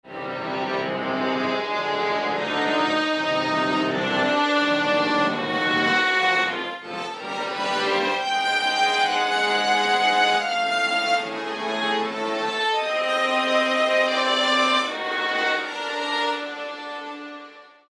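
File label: Violins Sul Ponticello; Roland L-CDX-04 Strings And Orchestral Percussion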